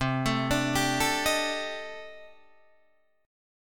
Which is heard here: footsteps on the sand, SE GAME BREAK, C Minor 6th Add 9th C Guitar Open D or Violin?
C Minor 6th Add 9th C Guitar Open D